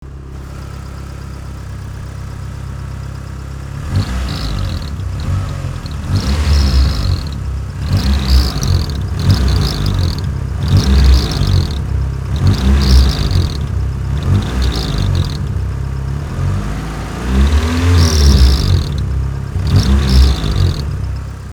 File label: mystery noise, engine swap